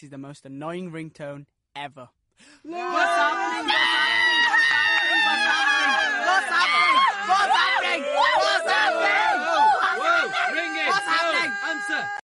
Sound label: laugh